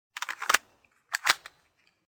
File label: reload rifle; plague